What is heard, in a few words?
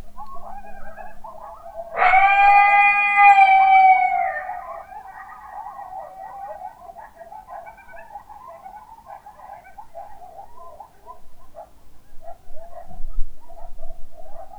Coyotes